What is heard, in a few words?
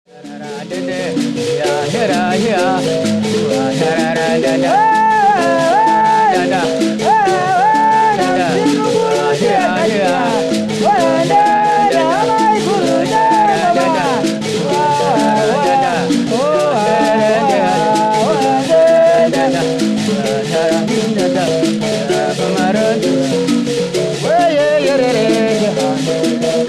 group sample, mbira